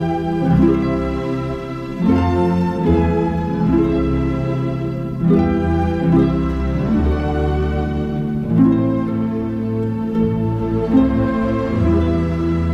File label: Harp, Strings; Chamber Orchestra; Concertino for Harp, Strings and Precussio; Autumn